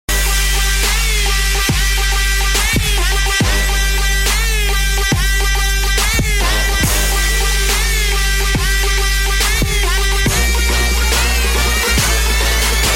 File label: Trap Music